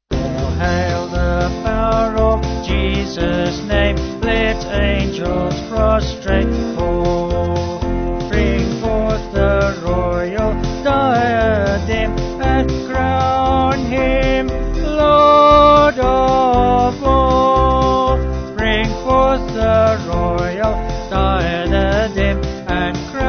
Vocals Band

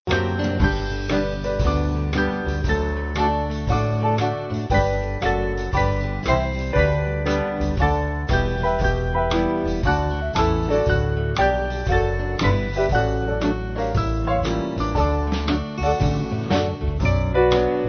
Band; Adeste Fideles

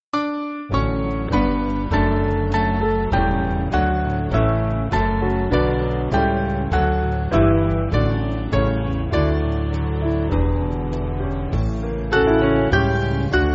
Piano, Backing Files for Churches; Author Of Life; Gweedore

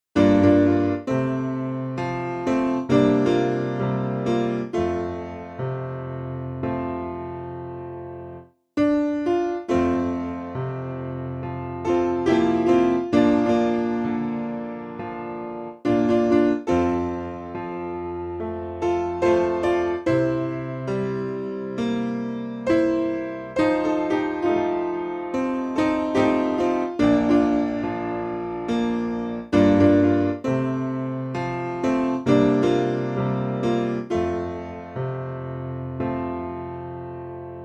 Jesus Walked This Lonesome, Spiritual, Piano

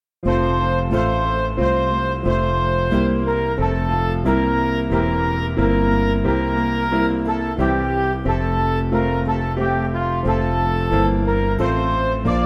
National Anthem, Midi; God Save

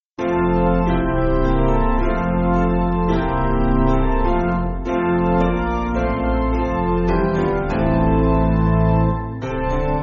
Piano

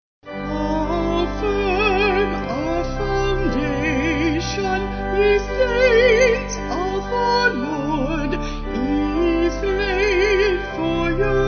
Vocals Organ

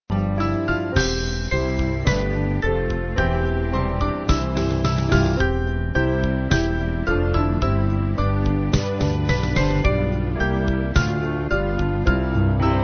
Band, Music Backing; While Shepherds; Christmas

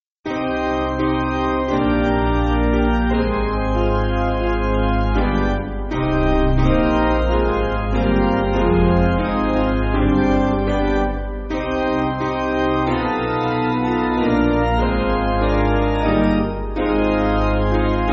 Piano

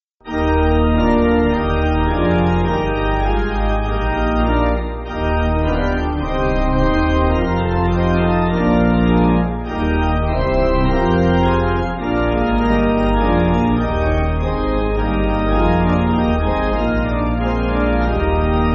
Pipe, Pipe Organ, Backing Files for Churches; Eb; Pant-Gwyn 8.7.8.7.7.7

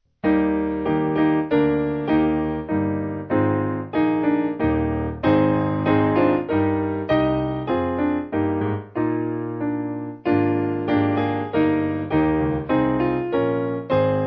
Bread Of The World Sacrament Piano

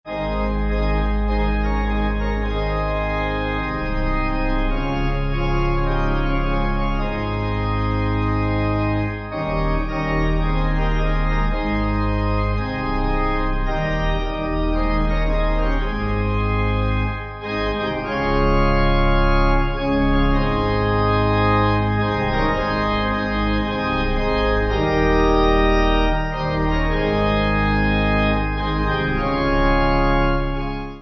Pipe

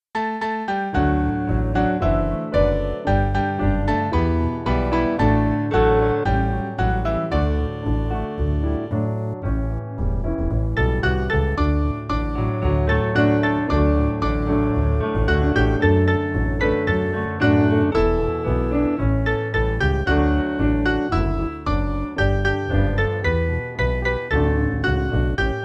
Piano

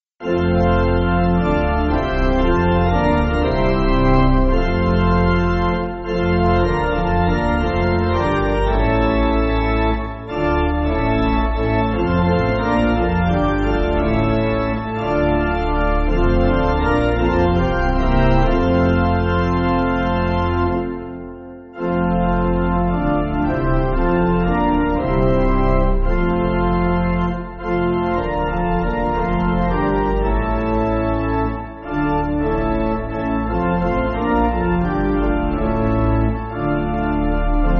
Pipe Organ; O Heavenly Jerusalem; Paderborn

